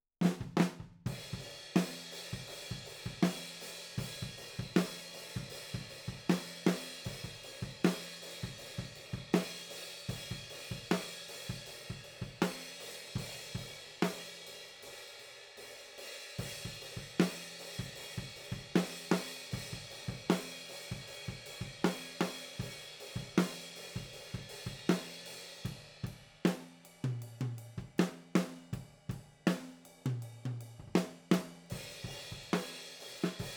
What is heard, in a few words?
OH A